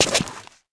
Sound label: pl step jump, player